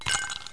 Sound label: ice; Interactive Media Design Review 1999